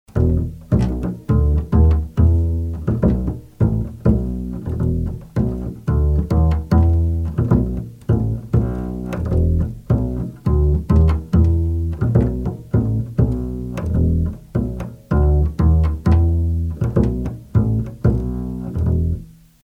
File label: ML-1 BASS